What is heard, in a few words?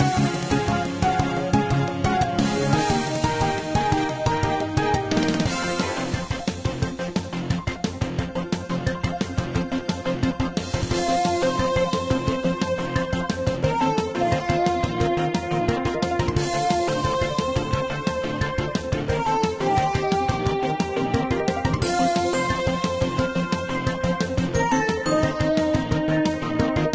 Boss fight; quest town saga android ios mobile gamerip 2019